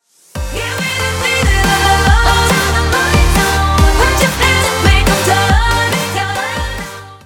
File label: Applause Buzzer